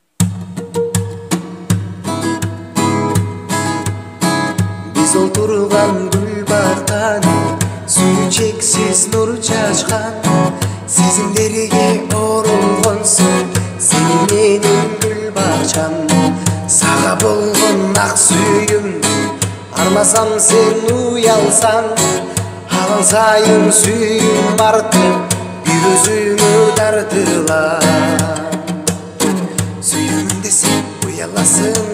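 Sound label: guitar cover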